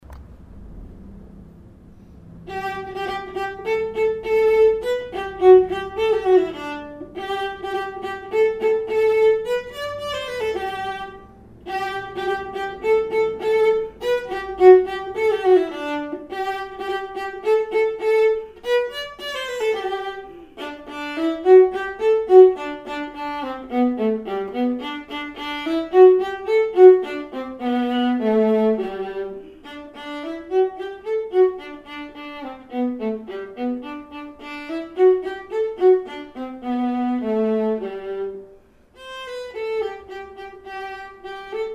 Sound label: Trinklied vla; melbay; Beethoven